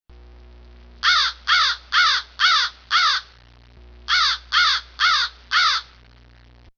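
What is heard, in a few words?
vorony, crows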